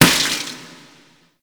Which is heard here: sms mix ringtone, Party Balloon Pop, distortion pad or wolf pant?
Party Balloon Pop